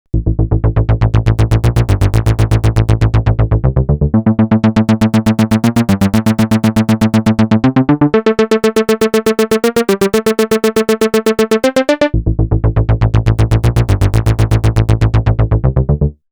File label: synth